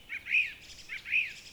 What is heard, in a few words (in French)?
2x trutruuu, Oiseaux